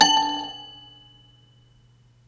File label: soft D4; Ableton Foundation FreePacks; Foundation Glitch Toys & LoFi